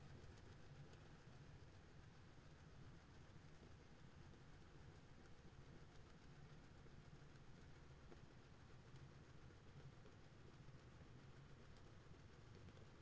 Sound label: HYDROPHONE 16000Hz; JASCO AMAR HYDROPHONE 740